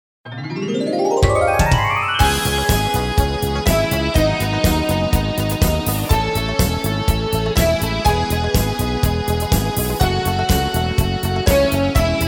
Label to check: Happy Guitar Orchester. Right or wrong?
Right